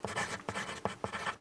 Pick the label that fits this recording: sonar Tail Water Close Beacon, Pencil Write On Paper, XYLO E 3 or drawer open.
Pencil Write On Paper